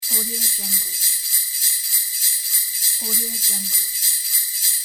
christmas sleigh bells

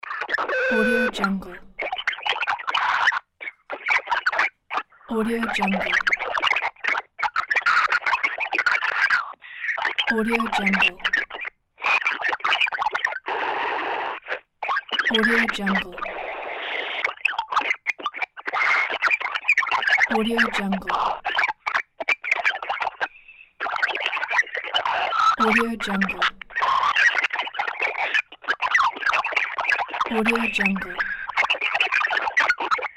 digital interference

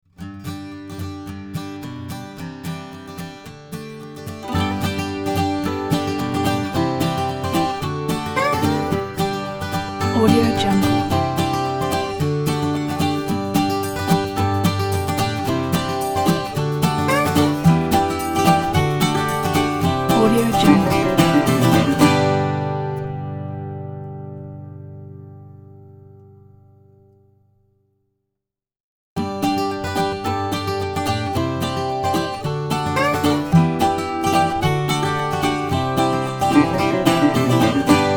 happy farm song

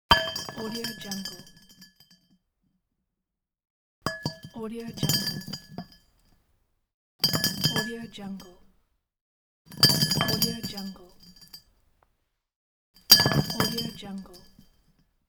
metal pipe rolling on concrete

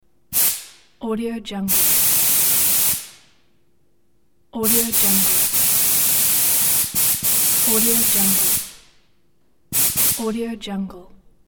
spray deodorant

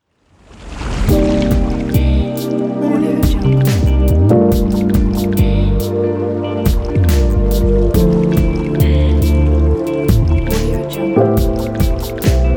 element of water logo

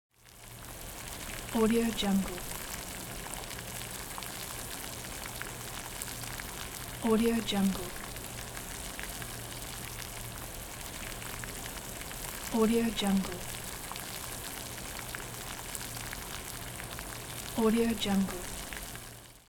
boiling water

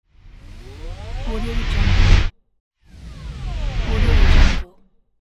zoom

